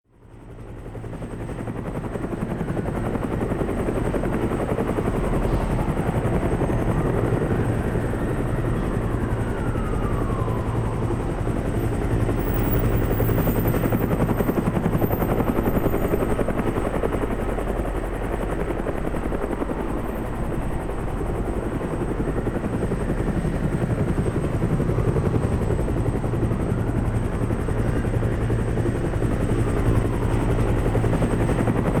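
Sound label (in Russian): вертолет тревожная атмосфера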